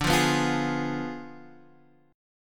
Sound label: D Diminished 7th Guitar Half Step Down, chord